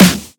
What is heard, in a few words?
Trebly Steel Snare Drum Sample F# Key, snare sound tuned to the F# note